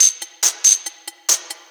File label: Loop 140 BPM; Vengeance Samples